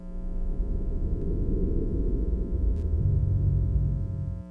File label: bell